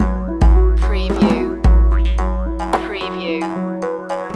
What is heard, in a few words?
river adventure loop